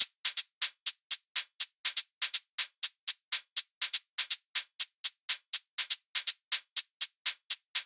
122 BPM Hat Hi Hat; MMB Studio Melodic; Essentials

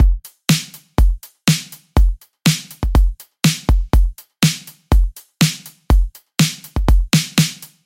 122 BPM Battery Full Drum Essentials Pro; MMB; full drum kit

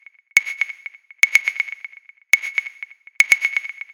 122 BPM Wood; mmb studio melodic

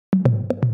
122 BPM Toms; mmb studio soundtrack essentials

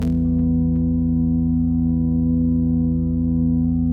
122 BPM Pads Cmaj Amin, ambient synth pads; Tonic A

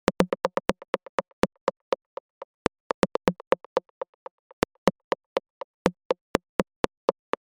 122 BPM Rims; percussion essentials 1

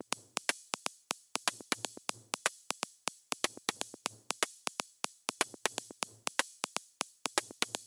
122 BPM Micro Drums; percussion essentials 1

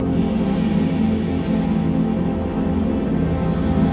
122 BPM Ambient C maj A min Tonic E; mmb studio soundtrack essentials

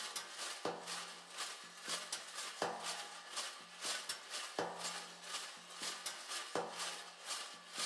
122 BPM Foley Ambiente Background; mmb studio melodic

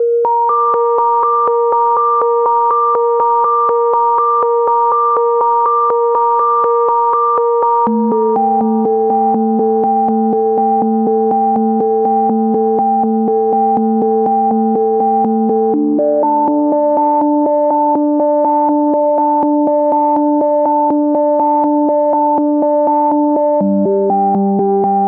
122 BPM Melodic Arps D maj C min Tonic F; MMB

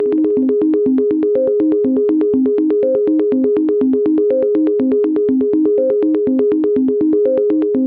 122 BPM Arps C maj A min Tonic G; arp essentials; Flux Arps

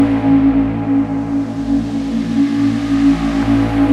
122 BPM Soundscape A maj F min Tonic A; mmb studio soundtrack essentials

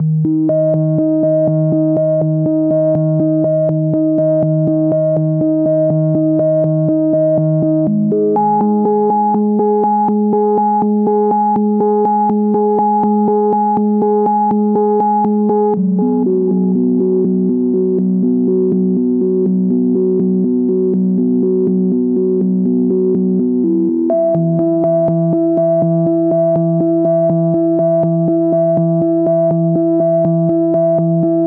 122 BPM Melodic Arps A maj F min Tonic C; MMB

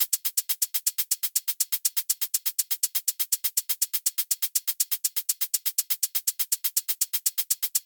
122 BPM Hat Groove Hats; mmb drum arsenal vol 1

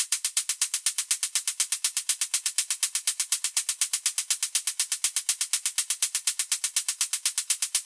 122 BPM Hats Hi Hat; Spectrum; percussion essentials 1

